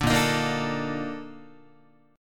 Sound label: Bb Major 11th Guitar, chord; strummed; JGuitar